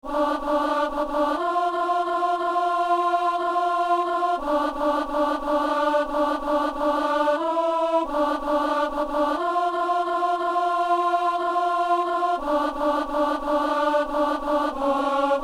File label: alto; Bénis le Seigneur, O mon âme